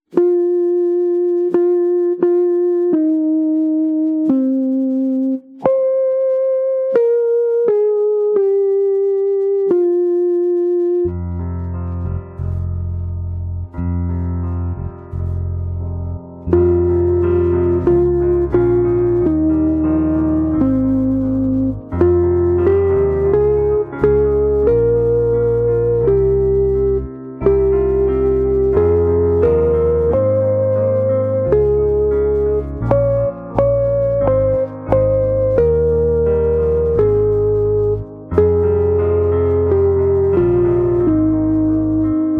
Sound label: piano guitars